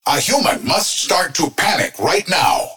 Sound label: Fire Alarm; Edinburg; Alarms; World Of Warships; Star Emissaries